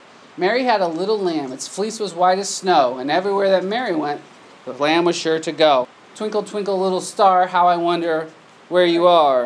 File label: nursery rhymez